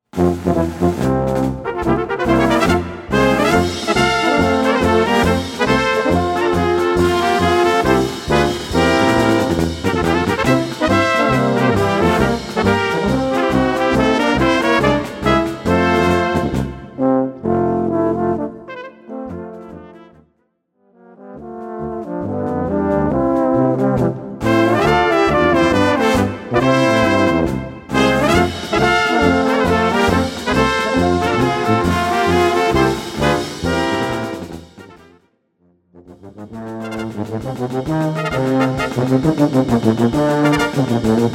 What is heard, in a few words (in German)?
Marsch